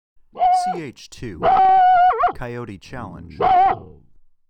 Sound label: Coyote Challenge